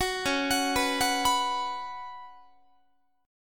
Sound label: C sharp 7th Suspended 4th C sharp, Guitar; C#7sus4; arpeggiated; tuning=4A,4E,5A,5D,5G#,6C#; guitar chord